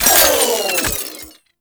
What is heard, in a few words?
Low Clip Mech; Warframe; Fulmin Prime